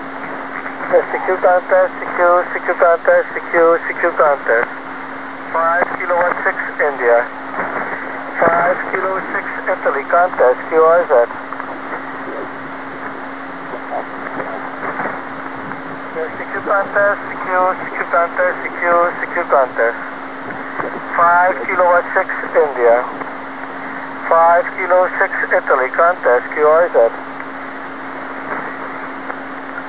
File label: ssb test